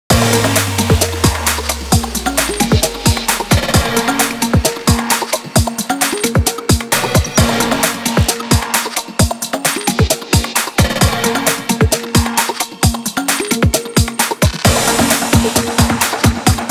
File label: Alert; Blue Archive Original Soundtrack Vol.1 Longing for the Memorable Days